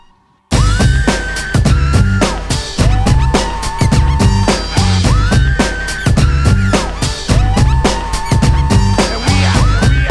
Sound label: breakbeat